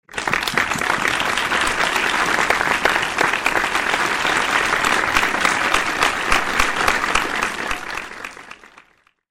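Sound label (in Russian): аплодисменты зала затяжные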